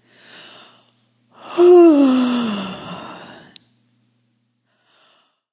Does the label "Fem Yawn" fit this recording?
Yes